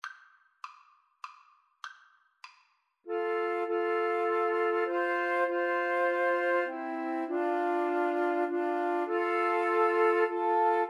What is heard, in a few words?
amazing grace, Flute Trio